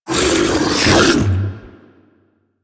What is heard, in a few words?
bowl devour; Warframe; Warframes; Grendel